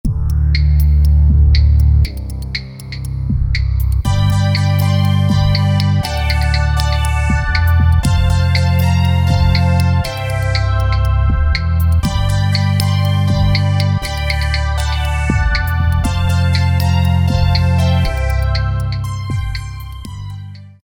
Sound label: RhythmWorld, Alesis Micron; Factory Setups